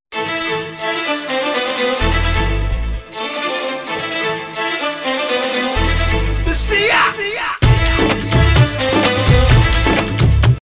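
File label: background music Telugu Ringtone; Lucia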